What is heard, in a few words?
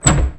Door open; 100rogues